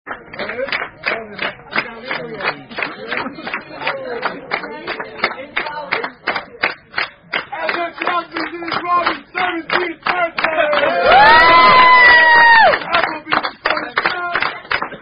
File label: Singing Happy Bday, Happy Birthday (Tune Sound Off); Applebees